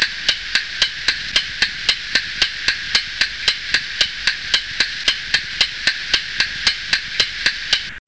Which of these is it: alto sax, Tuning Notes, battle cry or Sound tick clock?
Sound tick clock